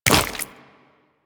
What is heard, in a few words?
Land Flesh, Footsteps; Warframe; Tenno